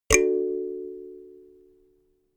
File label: notification kalimba triad major